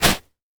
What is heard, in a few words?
sand; FootgolfEvolution